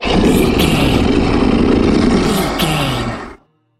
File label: troll monster growl